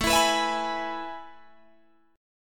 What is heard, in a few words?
A Major 7th Suspended 2nd, Guitar, Open G